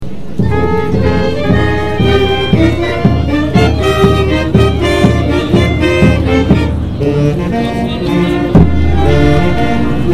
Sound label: barga national anthem